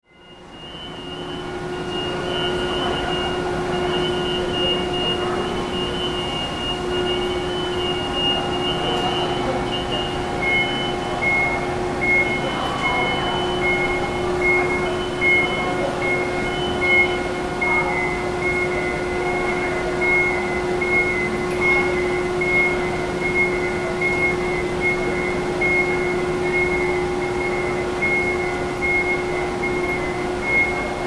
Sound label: robotic guided vehicle beeps